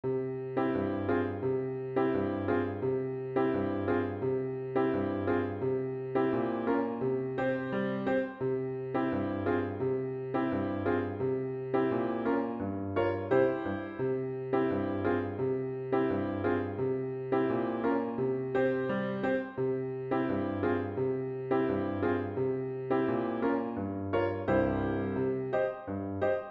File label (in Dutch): Little Galitsian Dance alleen piano snel